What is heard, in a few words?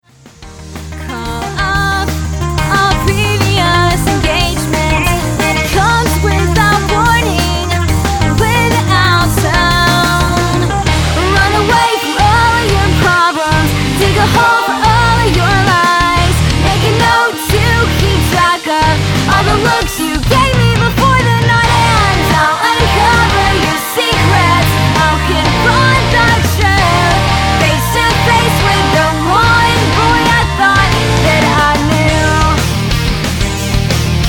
Rock